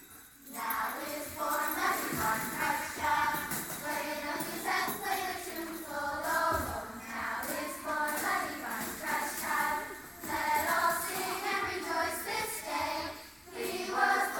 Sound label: Kids Choir; NowIsBorn